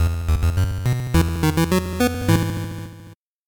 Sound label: 8bit jingle fast